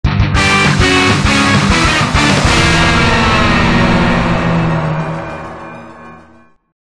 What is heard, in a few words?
Lose; king of fighters all star gamerip